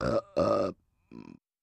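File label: Double Throaty Belch; belching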